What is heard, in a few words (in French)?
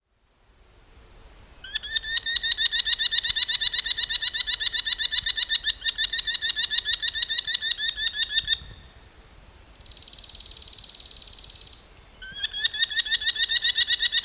Faucon emerillon Falco columbarius